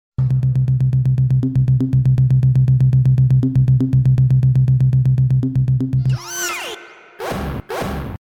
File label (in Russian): so strannym finalom